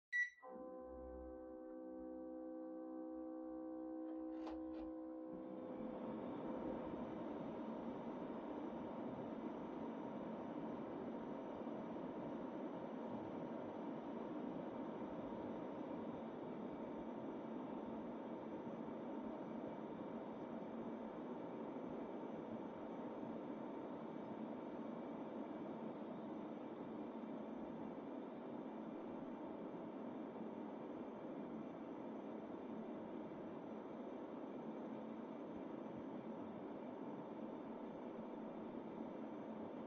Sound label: Air Conditioner Far Low